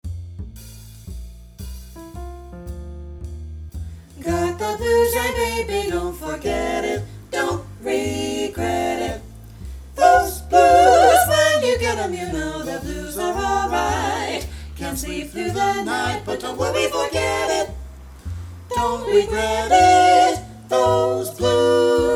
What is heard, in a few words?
Vocal Blues Etude in F Bass missing; NHMEA Jazz All-State Auditions and Festival